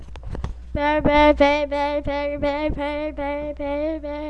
bell

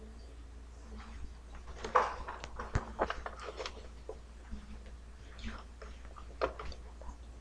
knife

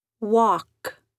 walk

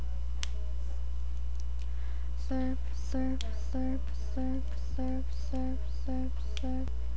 surf

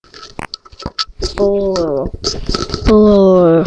bowl

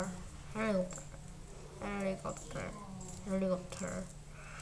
helicopter

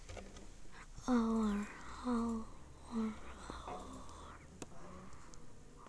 owl

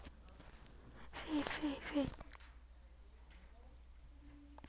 sweep

